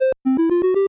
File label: 8bit se